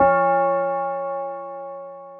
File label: RBell